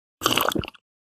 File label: conductor gulp; commander; baker; Titanfall 2